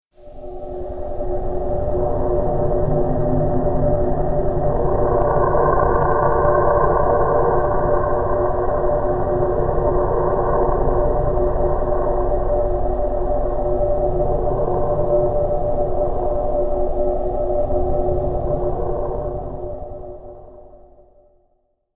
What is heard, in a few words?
dark dist